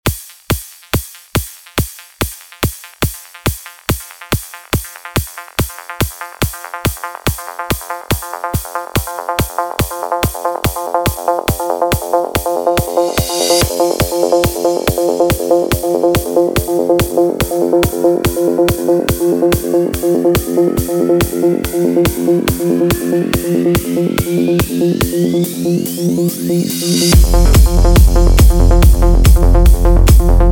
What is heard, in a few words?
142 bpm running beat mix